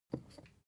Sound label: glass bottle pick up light